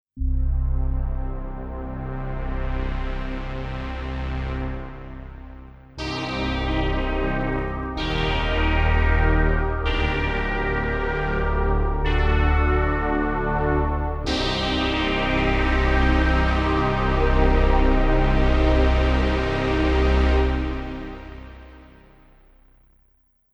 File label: sounds layered; Mighty WAVE